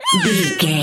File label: voice fun ant creature